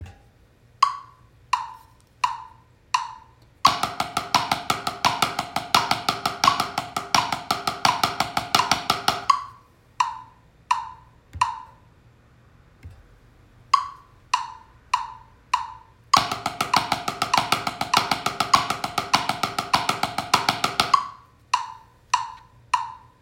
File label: paradiddles, drums